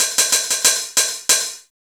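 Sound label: MPC4 hi-hat; USB Soundscan vol.02; Underground Hip Hop; AKAI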